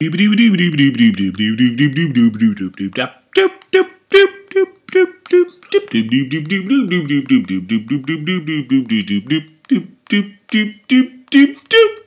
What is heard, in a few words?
jazz